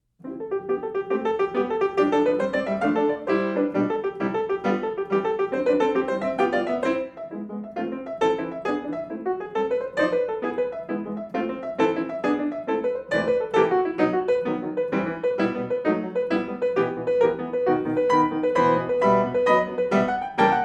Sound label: Tarantella, piano; Mendelssohn; Op.102 No.3